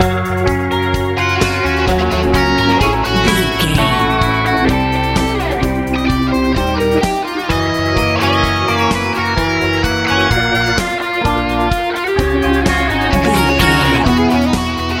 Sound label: new wave